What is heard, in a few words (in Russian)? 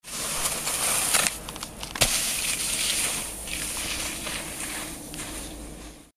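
скольжение на холм прыжок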